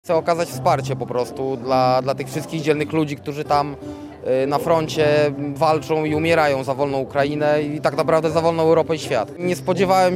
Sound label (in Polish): WIELOGŁOS publiczność koncertu; Olsztyn Solidarny z Ukrainą